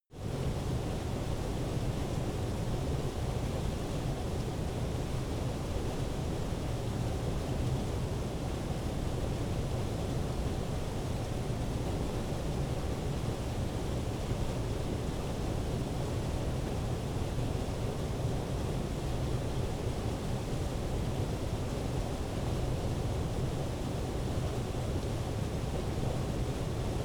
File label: stream estuary averted